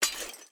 minion armor; smite; npc; roman archer

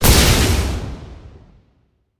activate; smite; god raijin

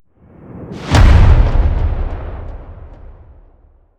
clap; flair; smite; cthulhu

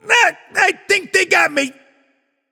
death; cupid

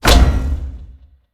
atlas hit; smite; god atlas

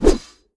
whoosh; smite; gilgamesh

